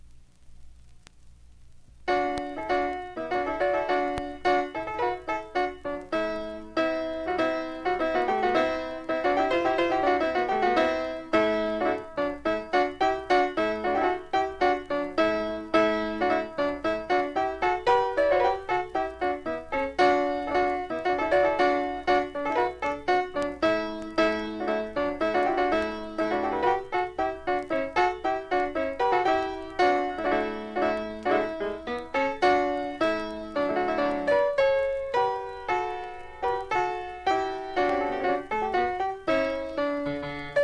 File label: Fanfare, Chamber Piano; Zeisl; Pieces for Barbara